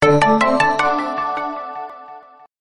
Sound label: Notify Sound